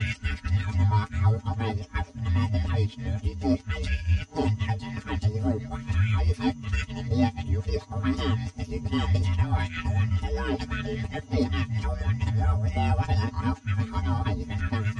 glitch sound; golden freddy

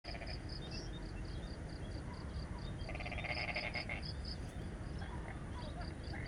morning fog

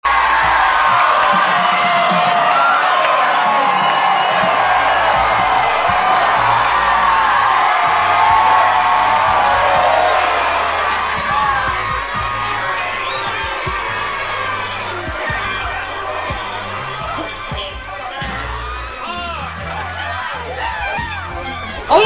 crowd cheers

